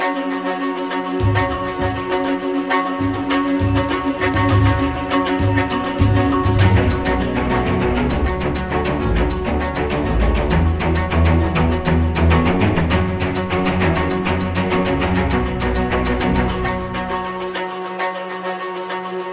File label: mood loop tense; spirit blossom